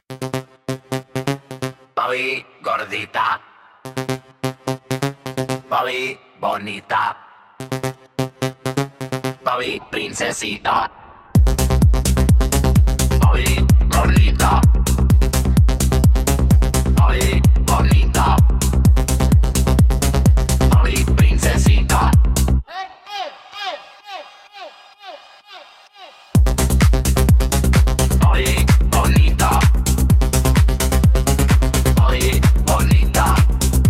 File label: techno; jjlmoya landings